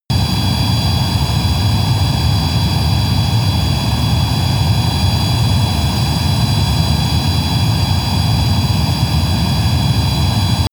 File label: military jet fighter turbine steady tonal